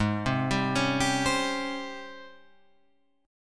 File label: G sharp Major 11th G sharp, Guitar Open F; JGuitar